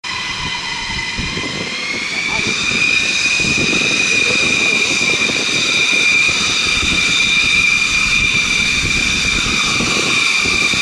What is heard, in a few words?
Chengdu J 20